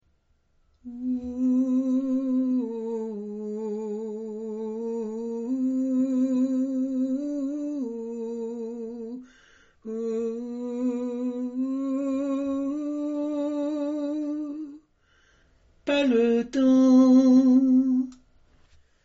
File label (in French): tenor